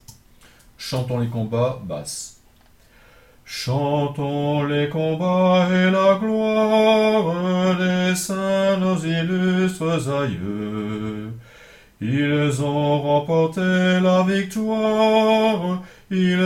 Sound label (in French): Chantons les combats basse